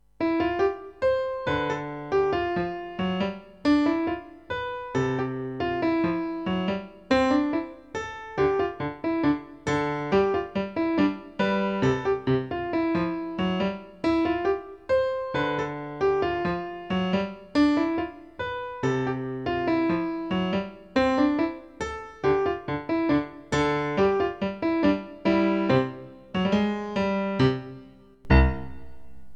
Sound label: Tango; Pinguin